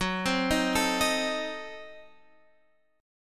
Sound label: Gb Diminished 7th, down, Guitar, Standard; Gbdim7; arpeggiated; JGuitar